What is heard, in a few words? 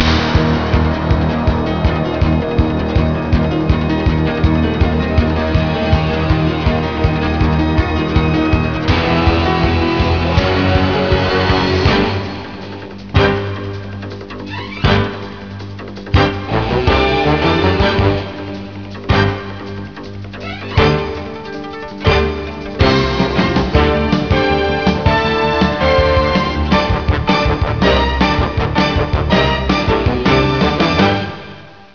Action News; ClipMedia™ Demo